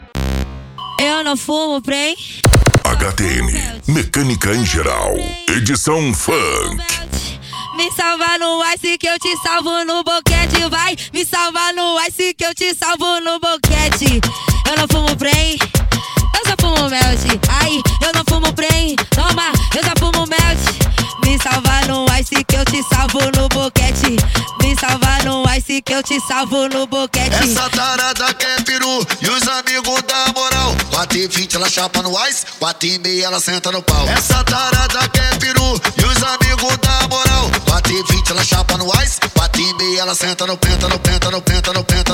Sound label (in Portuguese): mecanica em geral edicao funk